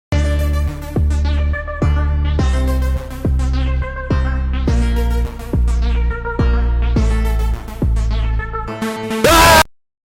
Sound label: New Ball Note Hit (Emotional); Friday Night Funkin' vs. Umbal; Family Computer Linux MacOS Windows gamerip 2021-2022